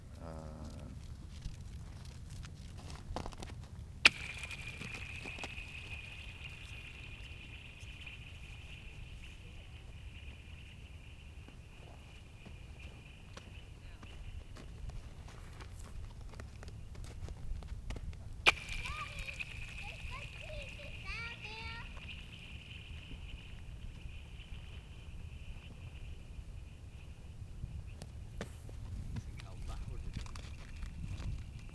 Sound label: ice on ice